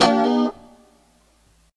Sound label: A FUNK CHORDS, Guitars; Spectrasonics; Hans Zimmer Guitars VOL-1